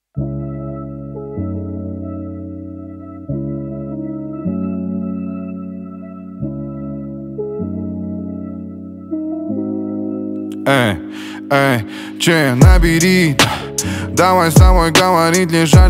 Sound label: ultra super slowed